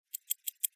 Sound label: ticking clock short